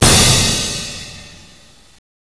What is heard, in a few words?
CYMBAL